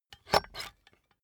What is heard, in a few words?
puncher squeak punch bulky